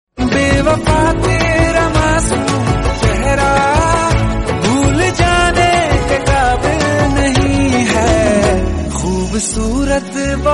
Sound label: Ringtone Male Version